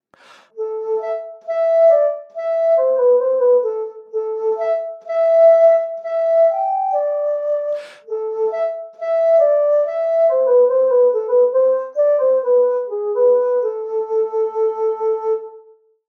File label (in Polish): Flet traverso